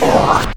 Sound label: Heat Beam Laser Line Appear